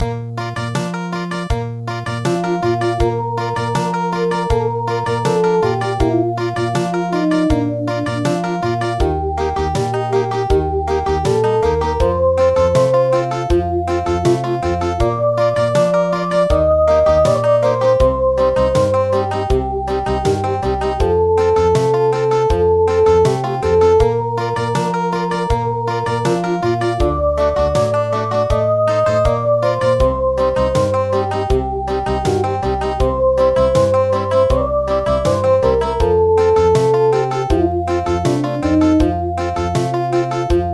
Country, midi; Aegukka

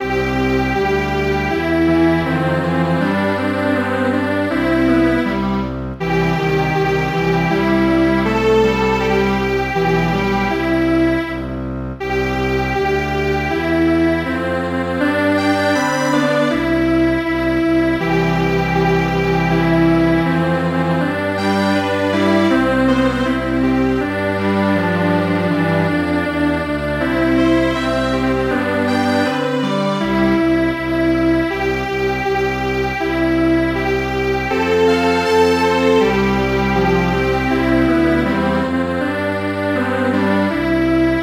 Strings Quartet; Chick

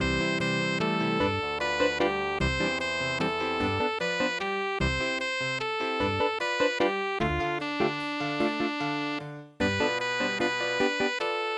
jazz waltz; Cielito Lindo

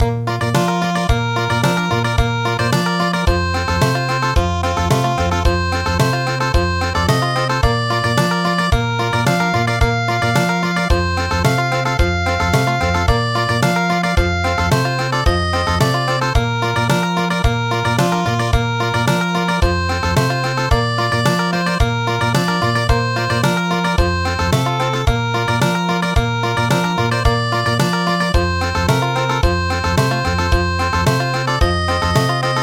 Country, midi

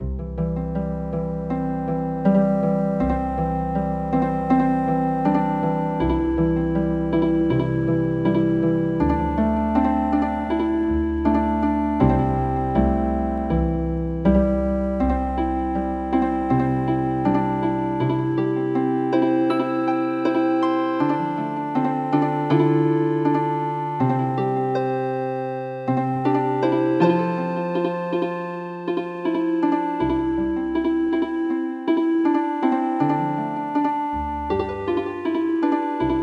Healin Harp

